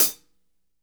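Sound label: hat closed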